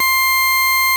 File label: Farfisa organ C4; Sonic Reality; Vintage Timetraveler